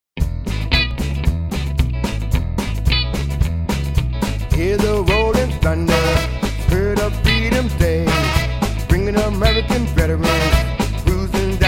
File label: Rolling Thunder, drums